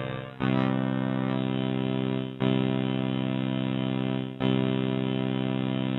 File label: spectraphon chord sammed; Make Noise